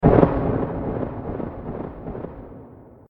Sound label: step; smokey; scp cb; 106; slender